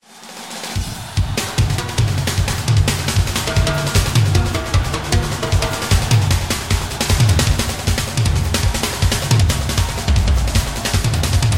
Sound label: driving snare and magic tom